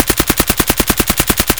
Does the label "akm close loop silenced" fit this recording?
Yes